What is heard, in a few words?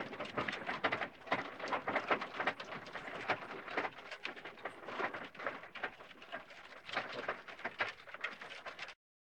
Wheelbarrow Loop